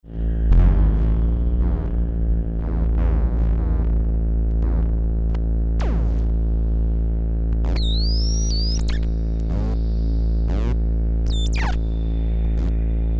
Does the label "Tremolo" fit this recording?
No